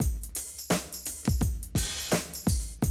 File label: Classic DrumLoops